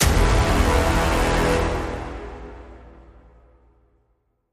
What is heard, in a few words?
Stab Big Brass (E)